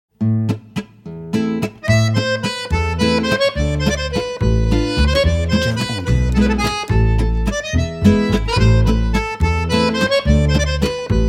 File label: French Paris